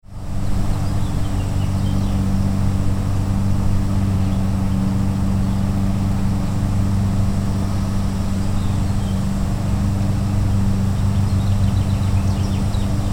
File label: Claverach Park Ambient